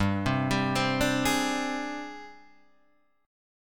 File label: Ab Major 7th Flat 5th G